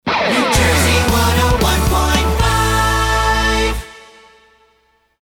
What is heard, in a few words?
Sung; New Jersey 1015